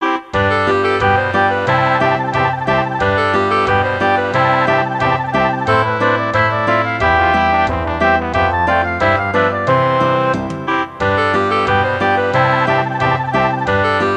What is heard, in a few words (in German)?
kinderlieder playback